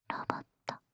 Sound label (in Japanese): ロボット; 無声音ASMR; シャッフル睡眠ランダム再生用; シャッフル睡眠法で眠ろう; バイノーラル音声